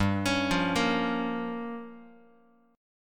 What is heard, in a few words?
G Minor 7th Flat 5th, Guitar